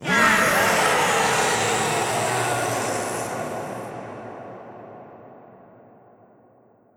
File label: Horde